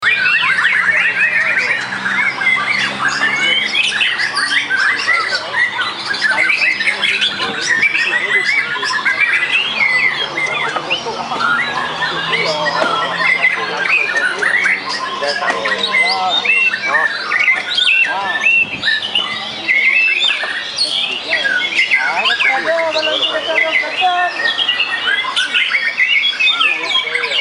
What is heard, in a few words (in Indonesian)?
simulasi lomba untuk murai batu